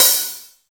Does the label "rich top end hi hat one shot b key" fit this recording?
Yes